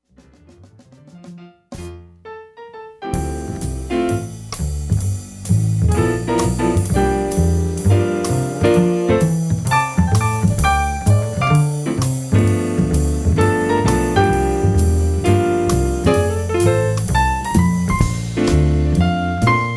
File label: trio